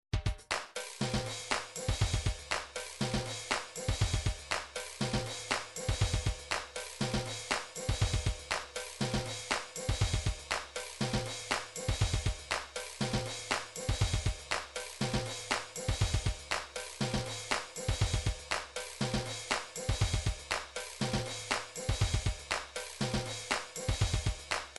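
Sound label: drums pattern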